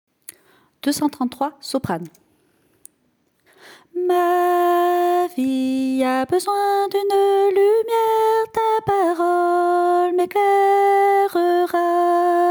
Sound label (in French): soprano